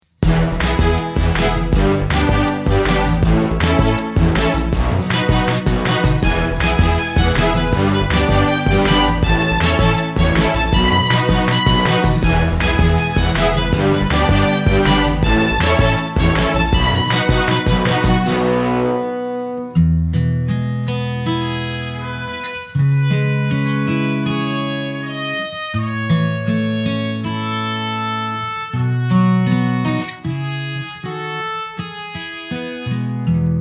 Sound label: Music Machine Instrumental